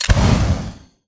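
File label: Tick Movement Traverse Jets; Titanfall 2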